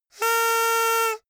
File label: hoot